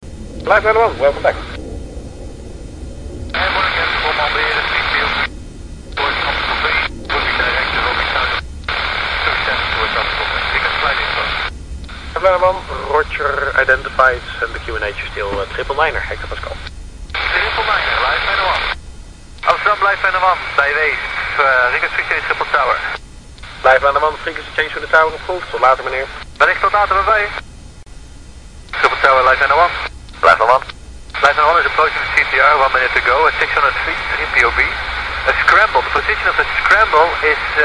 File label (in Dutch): scramble, Traumaheli; amstelveen